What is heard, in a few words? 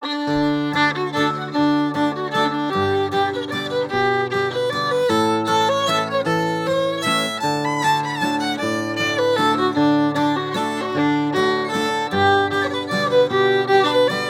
mexican waltz